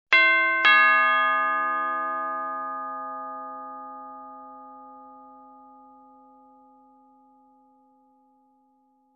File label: Doorbell jingle played on tubular bells